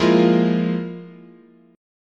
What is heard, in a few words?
F Minor Major 7th Double Flat 5th, chord